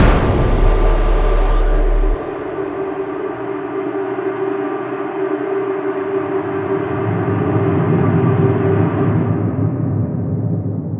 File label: Ambient Sting; Prototype